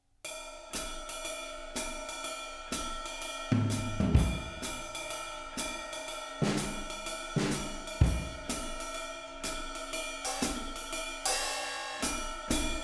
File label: 4 Piece 1 Mics, drum set